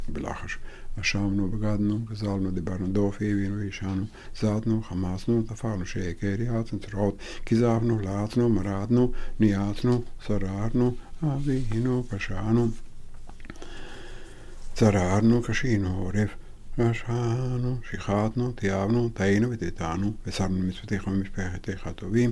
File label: Ashamnu kahal quiet; Kippur Musaf